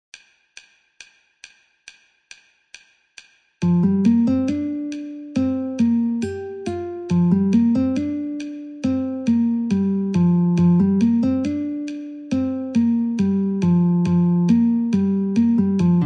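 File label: B flat Guitar metronome 69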